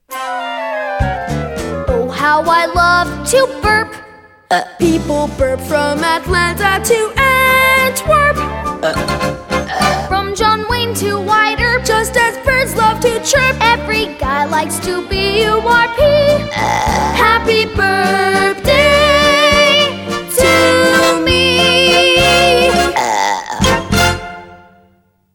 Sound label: Burping Song